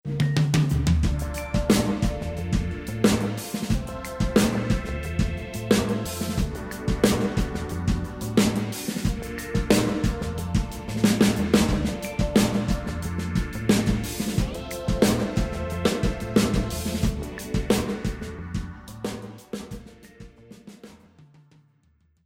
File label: Wet Drums; Hall machine set to Concert, Room machine set to Club.